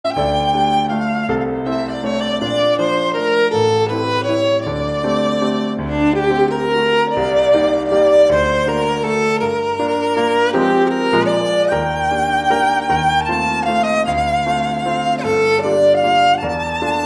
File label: Music on Viola; Studio Ghibli